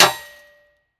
bullet hit shield; physics

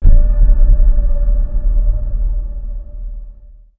amb random abstract; ambient; Portal 2

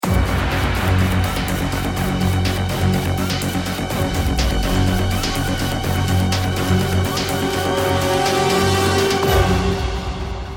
bomb ten sec count; music

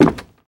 wood, player footsteps

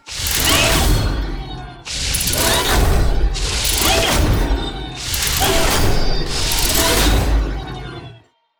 body drag, machines; ambient; Portal 2

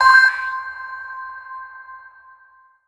beep, combine plug

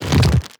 regular, zombie blind, foley; creatures

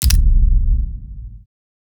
main menu press home, ui; Counter Strike Model Pack; CS:GO; panorama

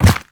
step soft, zombie blind

